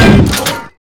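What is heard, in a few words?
grenade; ParoxysmII; weapons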